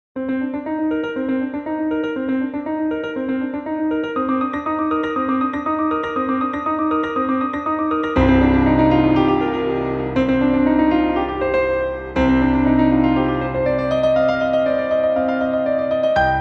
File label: Piano Cover